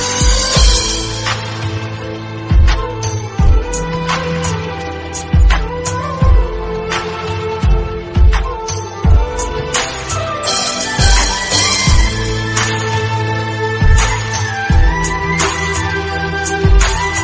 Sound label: Tamil Ringtone